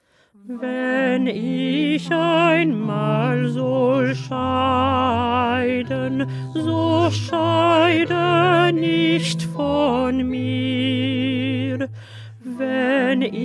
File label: Alto; Bach; St Matthew Passion